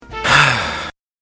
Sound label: sighing